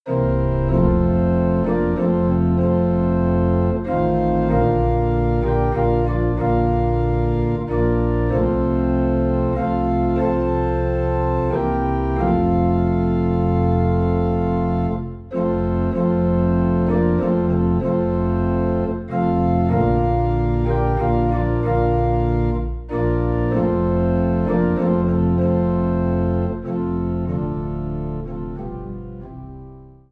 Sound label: Organ Low in F; The Comforter Has Come